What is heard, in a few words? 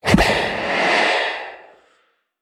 Voodoo Wake Up; we are the dwarves gamerip 2016